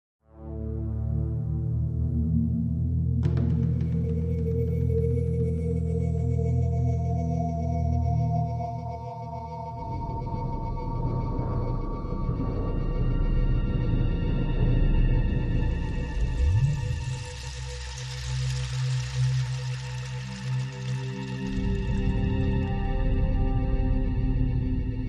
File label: Ominous Footsteps